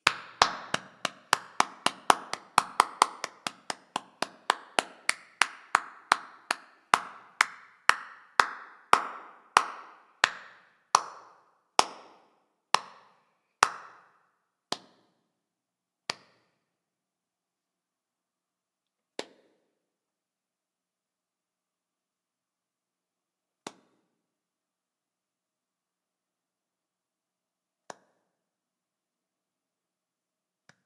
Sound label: Applause Etude